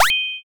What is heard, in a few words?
surprise